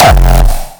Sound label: RAW KICK